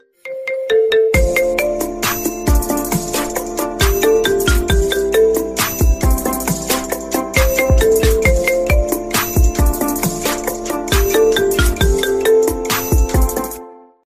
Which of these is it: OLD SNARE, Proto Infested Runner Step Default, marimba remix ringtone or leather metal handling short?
marimba remix ringtone